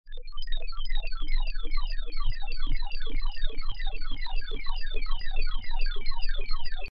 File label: Pink Noise Effix Delayed; Techno